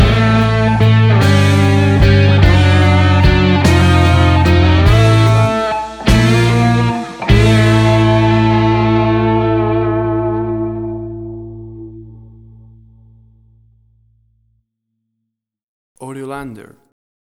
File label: Blues Bar Angst Stinger; AUDIOLANDER